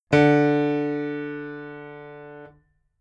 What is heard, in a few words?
Piano Note 147; Individual piano notes presented in Figure 5.5.